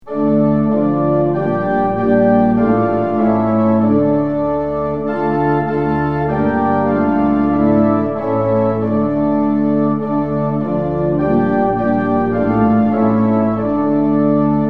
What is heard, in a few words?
tune; hymns; lillenas